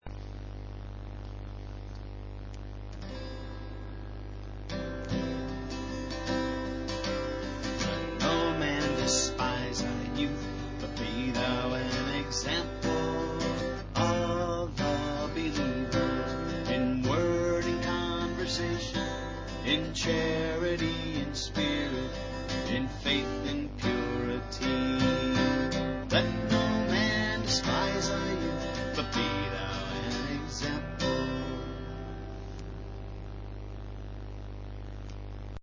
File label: song; mens camp